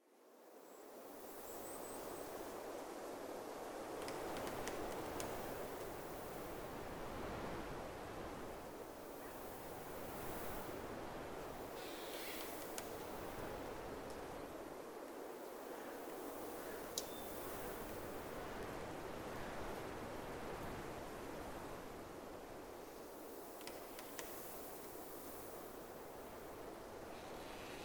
forest wind

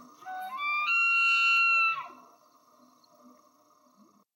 bugle, cervidae; ambient

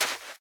sand run, step; blocks; Minecraft; Remastered

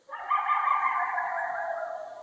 coyote; ambient

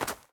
snow run, step; Minecraft; Remastered

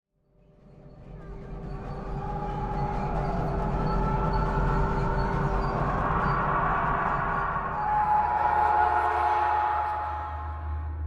cave, ambient; Minecraft

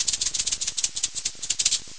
rattlesnake; ambient

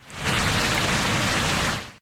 rain, weather

